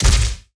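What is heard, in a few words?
Sci weapon Barrier Blast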